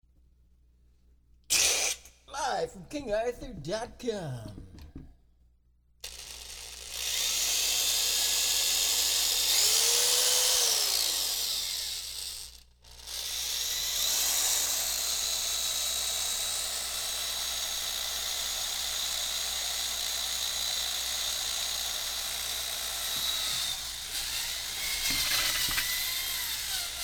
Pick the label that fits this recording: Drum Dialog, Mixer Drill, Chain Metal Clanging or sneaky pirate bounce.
Mixer Drill